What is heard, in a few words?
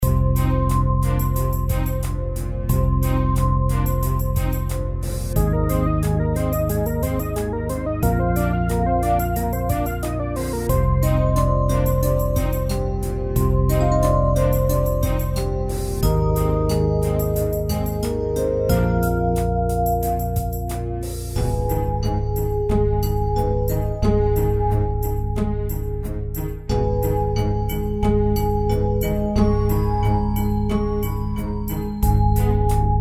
spooky